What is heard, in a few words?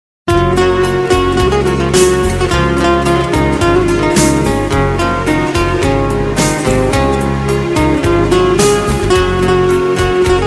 turkish folk giutar